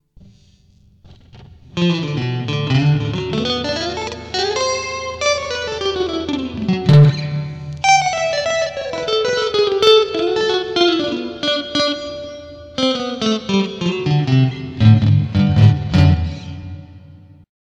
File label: Dual Pre AB Delay, Boss GT-8